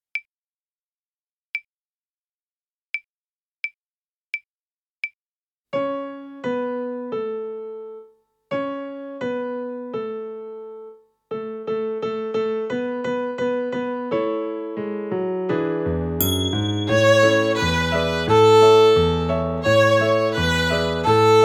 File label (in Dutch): Hot Cross Buns op alle snaren Altviool